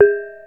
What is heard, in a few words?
reyong lo, gamelan; gravityharps